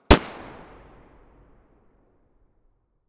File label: glock17 muzzle mono far